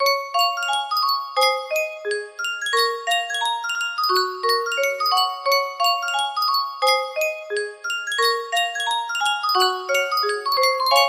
sankyo music box, music box melody

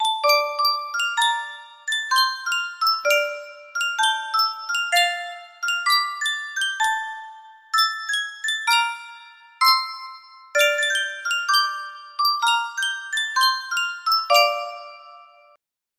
Sankyo music box

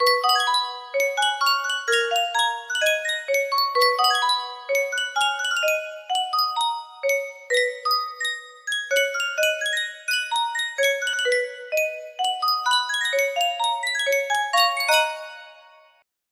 yunsheng music box, speed steady

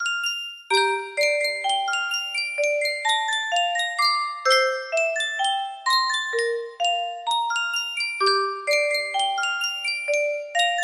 Yunsheng Music Box, music box melody

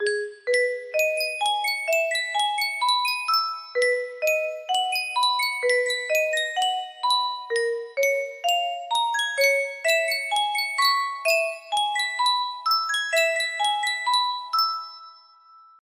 Yunsheng music box

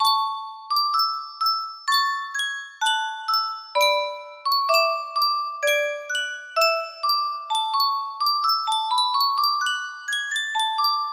yunsheng custom tune music box, music box melody

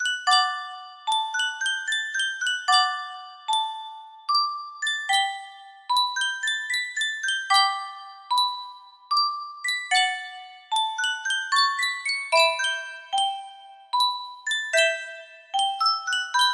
Sankyo music box, music box melody

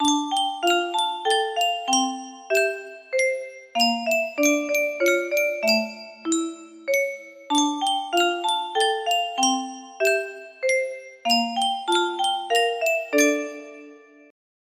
Yunsheng Mousikó koutí, music box melody

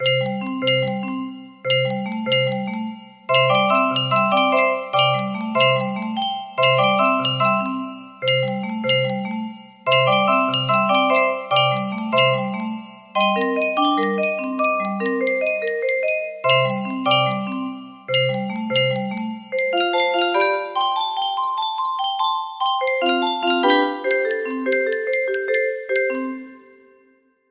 C major, music box melody

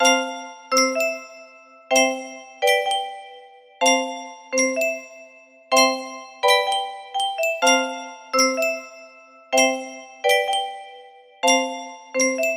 melody, music box

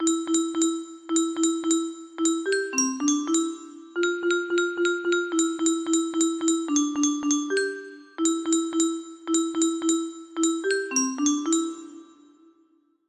melody, music box

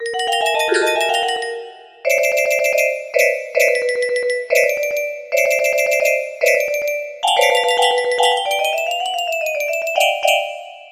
music box, music box melody

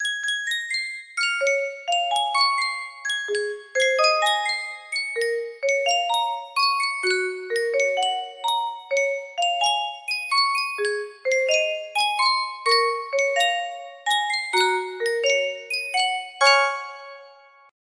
yunsheng music box, music box melody

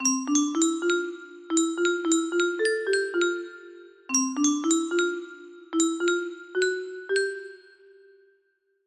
melody, music box

